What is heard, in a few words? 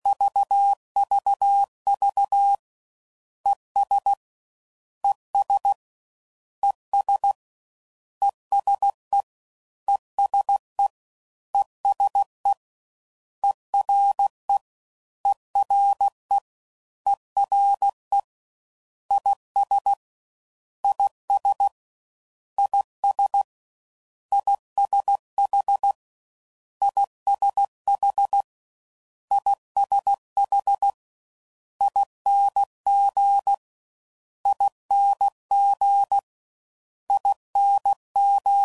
Suffixes, CW Code Groups 15WPM; Morse Code